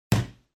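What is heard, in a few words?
volleyball hit on the ball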